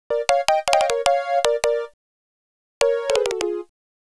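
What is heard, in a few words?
Flutes & Swingers Keys A#; Zero-G Kocktail Kollection; Construction Kitz (Lounge)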